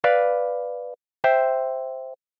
Bdim to Cmaj resolution

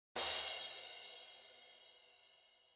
crash; drum

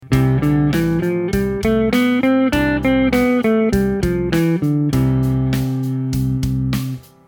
major scale

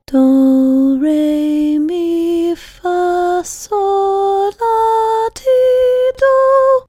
solfege do major scale; Hear the notes of the solfège scale

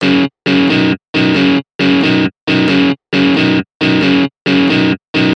Rests example

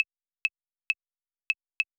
clave loop

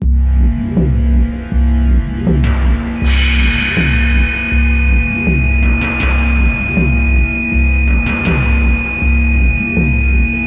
Halloween Improv Initial Sketch; Initial improvisation; Halloween horror music

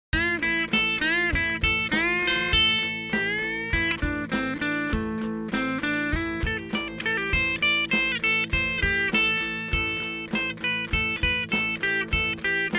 minor pentatonic

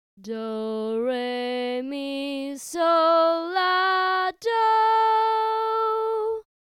solfa scale

